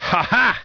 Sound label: haha